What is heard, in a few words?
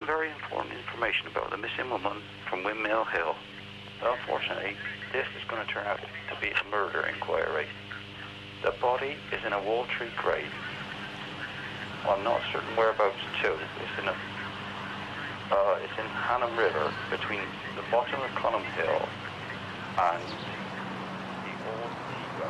Telephone Call; police investigation